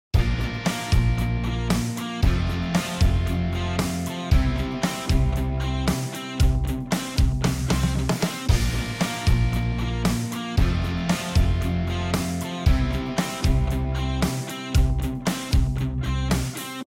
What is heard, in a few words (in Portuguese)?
harmonia bVII IV I